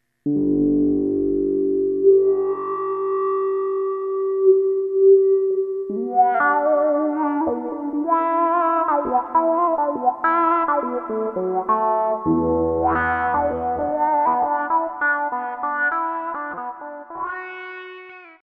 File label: Wah Wah Guitar